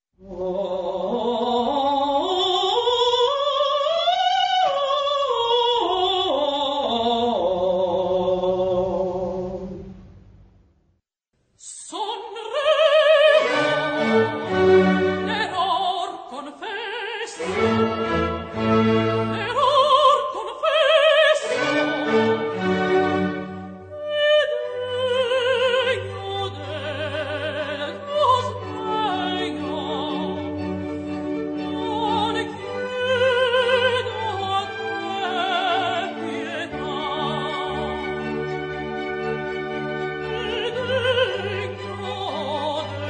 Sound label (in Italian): contralto